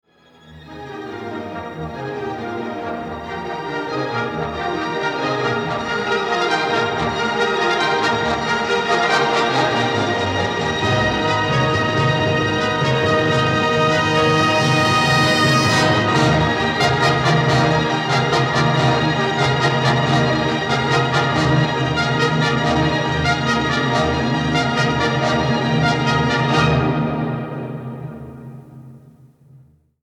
Symphony No. 8 in c II. Scherzo. Allegro moderato Trio. Langsam Scherzo; Bruckner